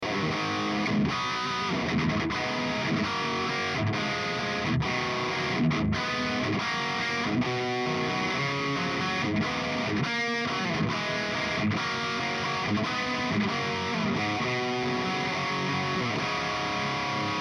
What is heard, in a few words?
RIFFS